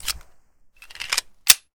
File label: ppk reload empty; zps; Weapons; Firearms